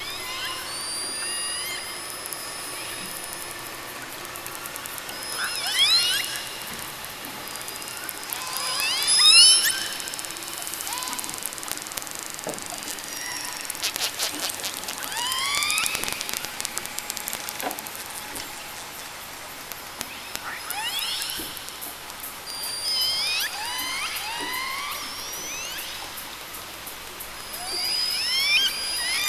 killer whale

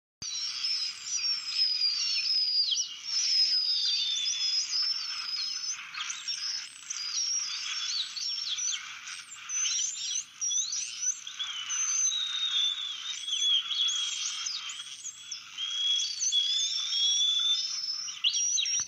Northern Bottlenose Whale

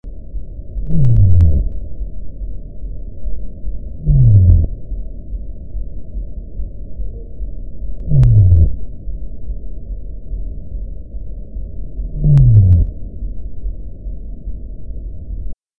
sei whale; mammals; speciescatalogue

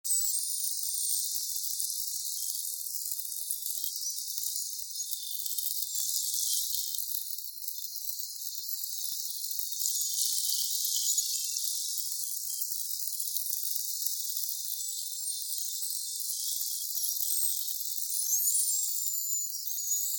Rough toothed dolphin whistles and clicks

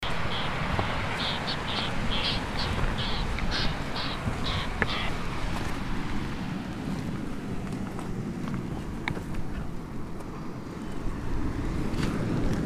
Wilsons Storm Petrel Oceanites oceanicus

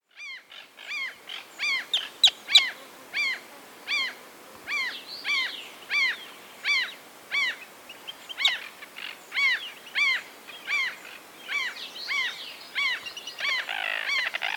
Common tern audio

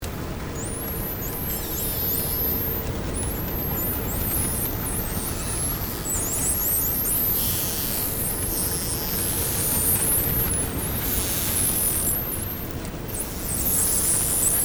Striped Dolphin

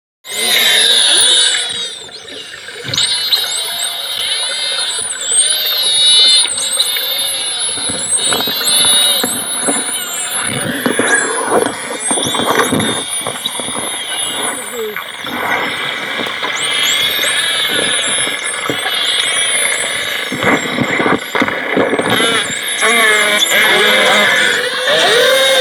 Common dolphins

